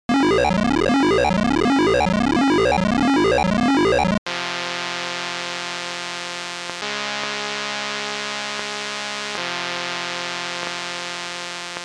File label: Menu Music; Rescue; Starbase (Merlin); Speccy; tatung einstein